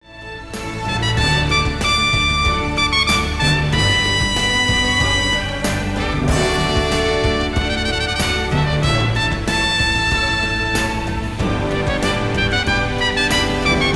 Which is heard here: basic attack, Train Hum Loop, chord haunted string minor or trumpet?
trumpet